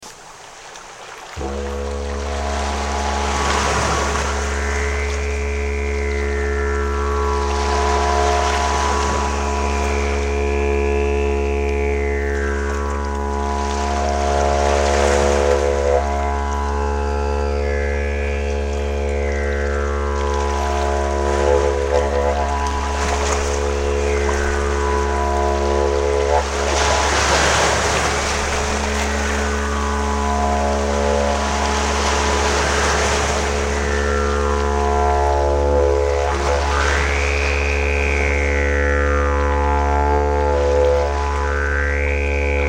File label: didgeridoo waves